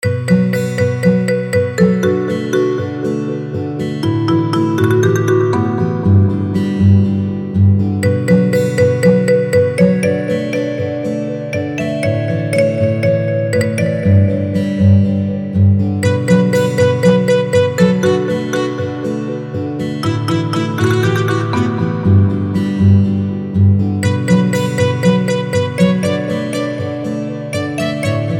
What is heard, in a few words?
Porcelain Marimba